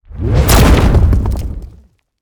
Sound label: Monster; Zenless Zone Zero